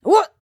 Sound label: flyer attack